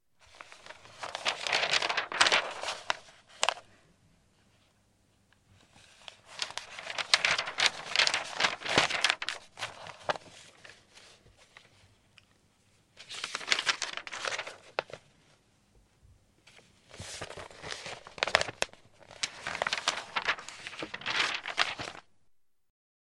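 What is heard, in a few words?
Magazine pages flipped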